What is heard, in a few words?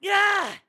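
Jump Attack, voice; BOTW; Breath of the Wild; Link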